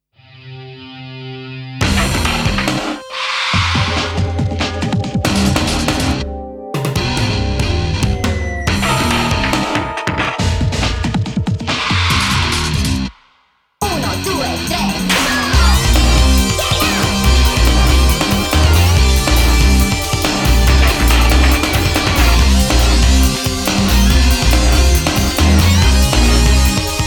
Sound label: Nonstop Beats; Rage Racer Remix The 20th Anniv. Sounds; AudioExhaust